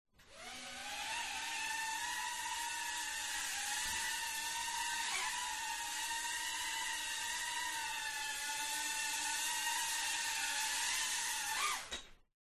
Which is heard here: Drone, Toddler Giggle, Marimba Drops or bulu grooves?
Drone